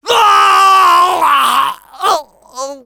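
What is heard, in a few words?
pain critical death, French; Medic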